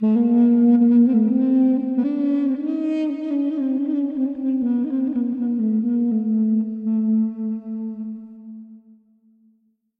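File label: duduk sounds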